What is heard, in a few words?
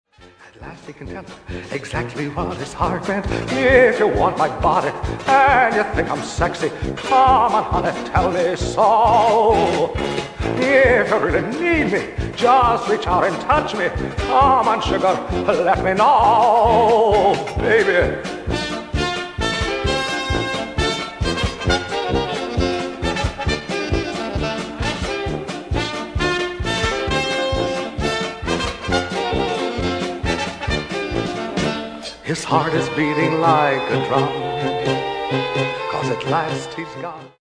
vocal